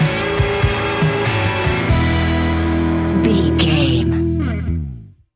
celebrating blue skies stinger, A rocking music track with rock guitars, heavy rock drums and thumping rock bass lines. Heavy, loud, aggress